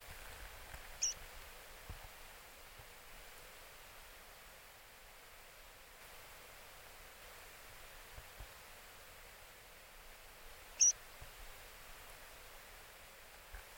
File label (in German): Bergpieper ssp. coutelli; Muntasar; Oman